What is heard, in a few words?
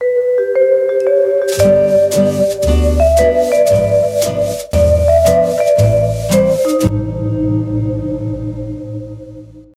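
transition music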